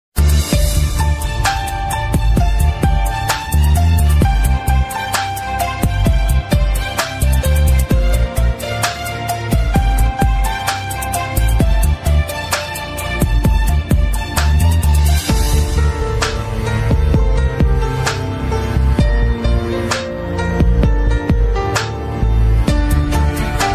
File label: romantic instrumental love instrumental ringtone